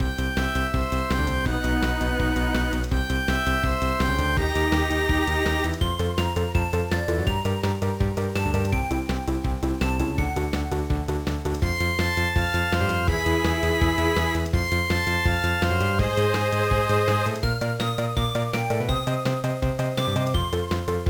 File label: BGM; Nihon Pro Mahjong Renmei Kounin Tetsuman Advance Menkyo Kaiden Series; gba